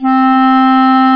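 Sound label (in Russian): кларнет одиночный длинный мягкий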